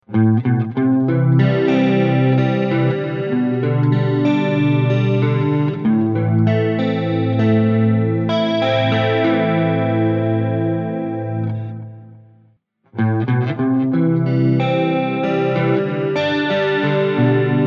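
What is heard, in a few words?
flanger as chorus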